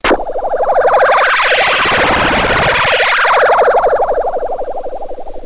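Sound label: spaceship, electron; Easy Personal Web Page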